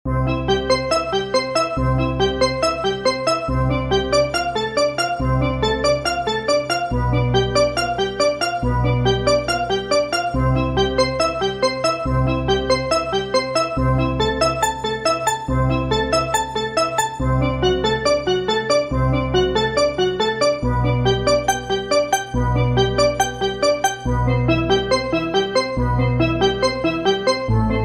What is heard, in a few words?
Prelude In C Major BWV 846; J.S. Bach